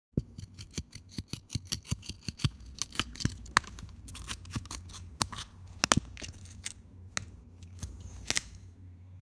sound of harvesting cutt, farming

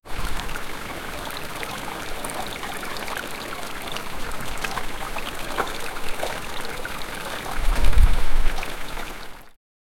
net dragging through wate

